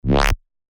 bass shot C#; Fact; revealed bass shots vol 4

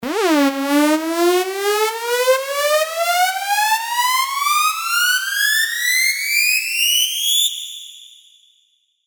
Uplifter; revealed serum fx vol 2

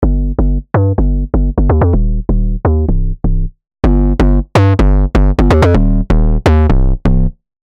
808 Wide; revealed serum 2 bass tech house vol 1

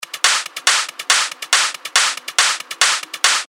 140 BPM, techno loops; revealed techno top loops vol 8

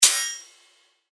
FX Hat, cymbals; revealed cymbals vol 1

